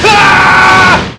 HEEHAA; JOSEPHIN

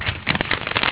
CRUMPLE, PAPER; EFFECTS

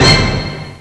BIG ORCH

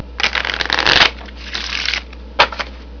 shuffle

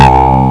ROCKET; Arcade

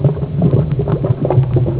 BOILING, KITCHEN; EFFECTS

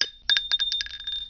SHELL

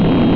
Rocket

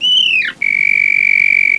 SCREECH

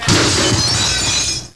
BREAKING, GLASS; EFFECTS

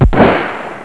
KABANG; WAR

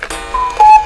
CUCKOO; HUMOR

